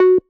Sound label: marimba; ゆめ2っき